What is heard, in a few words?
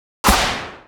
Metal elf Archer attack